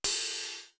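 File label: FLAT RIDE, cymbal; CYMB.HH; East West; Global Sounds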